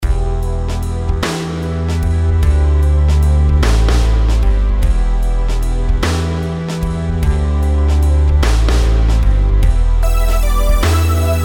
rhythms compound 8s

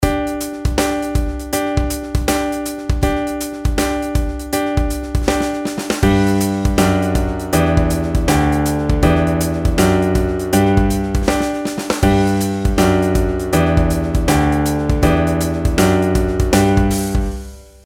pitch patterns stepwise; melody

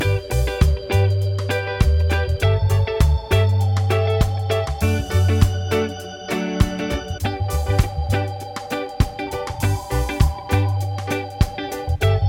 progs with applied chords

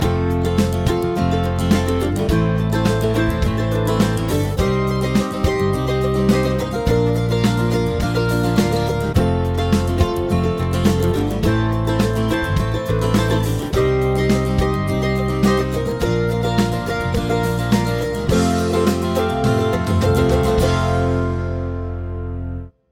chord prog with mixture

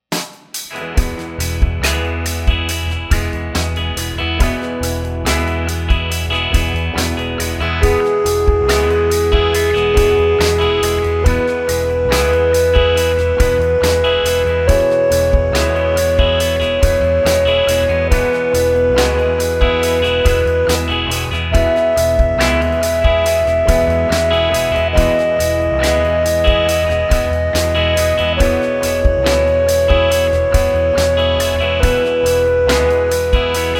scale degree

